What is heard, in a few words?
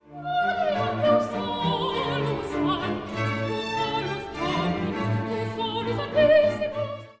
Gloria Quoniam